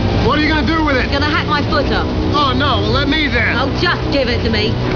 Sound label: axe; farscape